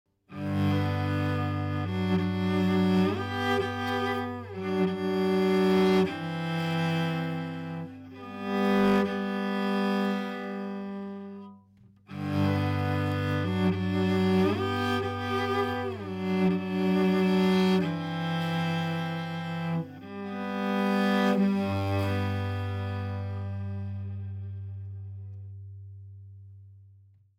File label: cello beauty